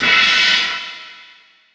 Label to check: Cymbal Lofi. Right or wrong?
Right